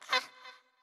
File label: Wimp Hurt